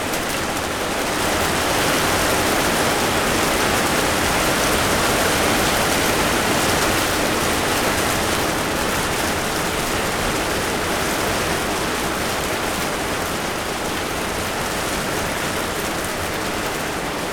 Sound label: rain heavy; ott3d